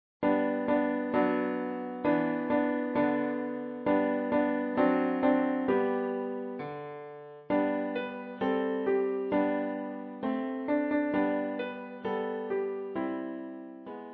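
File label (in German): Klavier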